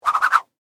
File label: Whip Whoosh Multi, Throw; Foley; Fast, Small; BlastWave